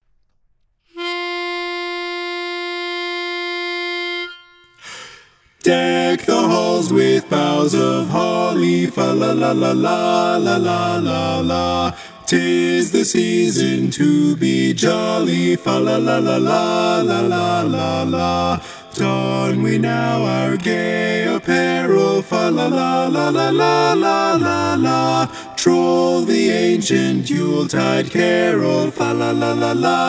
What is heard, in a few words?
Deck the Halls baritone missing